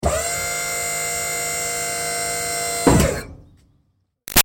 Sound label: Servo Moving Truck Med; Air Release; Motors; Machines; BigRoomSound